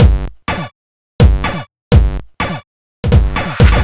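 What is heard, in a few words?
125 Bass Drum Snare, Drum Loops; Beatdown 1984; 125bpm; Nu Dance Electro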